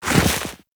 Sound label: walk deep snow, footsteps; Warframe; Operator